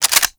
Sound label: Pistol Reload B; Warframe; Tenno; Tenno Harlequin Pistol